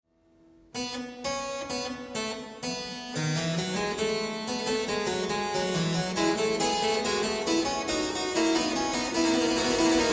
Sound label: Bach Fugue in F Major; book1